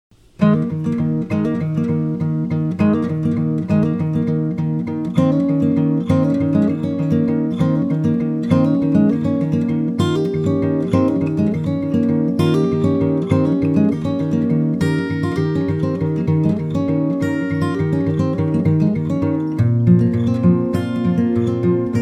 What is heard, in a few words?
cello song fragment